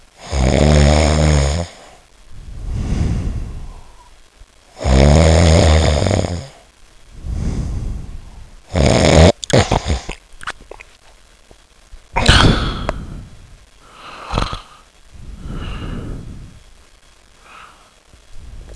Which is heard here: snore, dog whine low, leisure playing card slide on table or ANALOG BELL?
snore